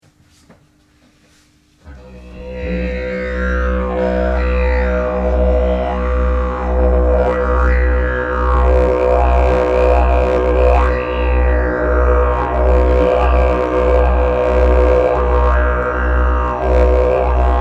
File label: Binaural Didjeridoo SI